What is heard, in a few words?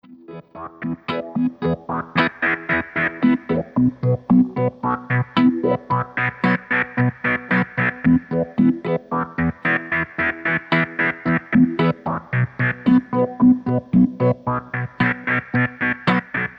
Stereo Chop; GFX CHOPPER